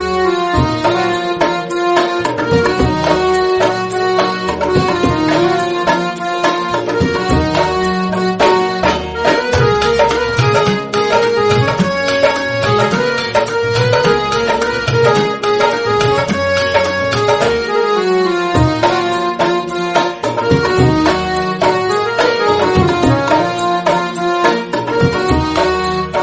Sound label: Instrumental